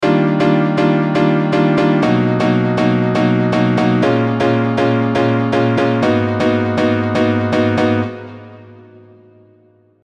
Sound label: Piano, Deep House; Unison Deep House Collection for Serum